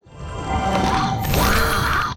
SANDWORM WAR POWERUP

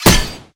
knife metal, Damage

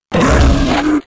CANNIBAL ATTACK

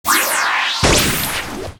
AIMING SHOT

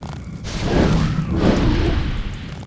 SIZZLER WAR IDLE

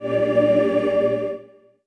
Quarrel Reload

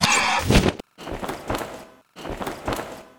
mahr attack

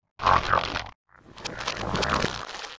peace idle; Fitton

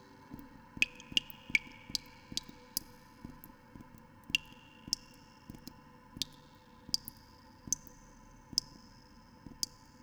Cave Echo, ambience

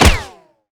Gun Cloth, Damage

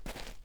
Walk

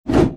mace, Weapon

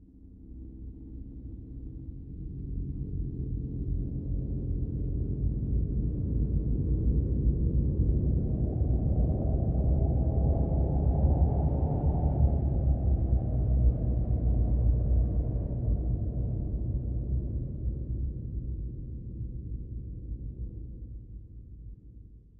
Volcano Ghost, ambience

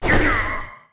WAR DAMAGE

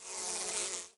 Bug, ambience

